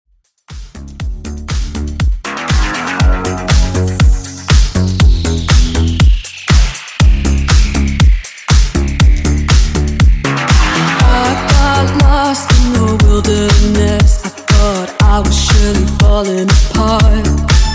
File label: Disco Club 120 Bpm